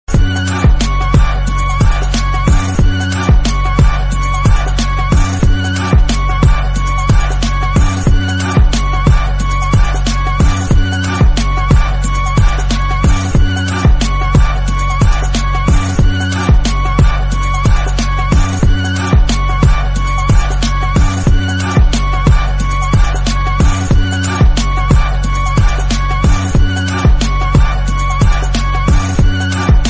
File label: Instrumental Song Ringtone